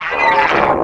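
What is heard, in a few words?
droid assassin dead; Star Wars Knights Of The Old Republic I; XBOX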